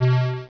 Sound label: Pulse; Grid Warrior 1.2.6 Demo